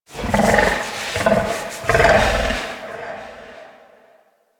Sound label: Forest Distant Medium Creature Medium Gurgle Snort; Warframe; Grineer Forest